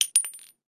case, explodium gun

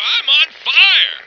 On Fire, male

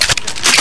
Cocking

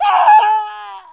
death; Marisa Kirisame

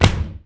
land

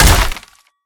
hit; hammer

